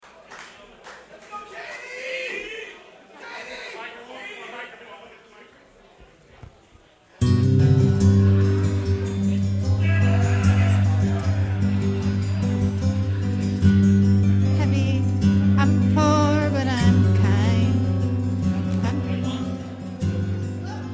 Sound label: (Acoustic Version), karaoke